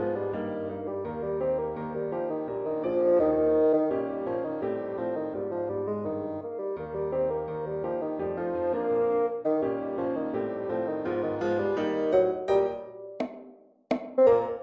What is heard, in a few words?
Ragtime Dance Tag Ending